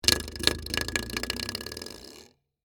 Door Stopper Move, Texture, Door, Stopper, Movement; Miscellaneous Doors; BlastWave